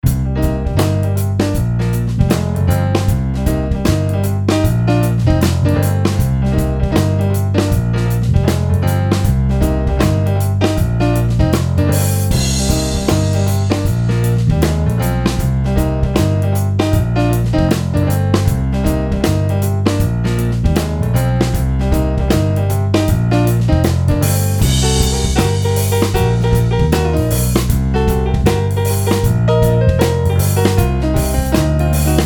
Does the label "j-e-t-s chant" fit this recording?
No